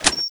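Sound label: grenade deploy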